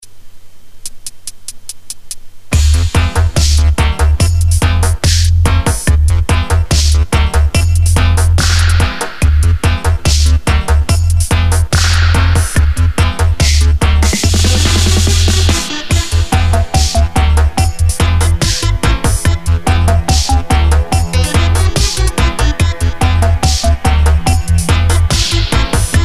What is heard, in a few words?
safari dub